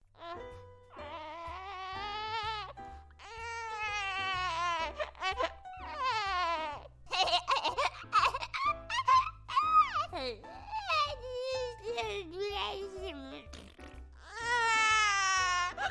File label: Baby Sounds, character voice actor